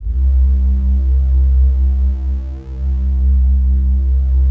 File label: PAD, DIGI-PAD